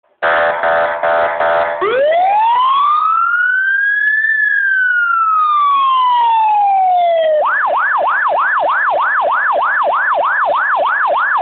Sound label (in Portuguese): Sirene da Polícia; policia civil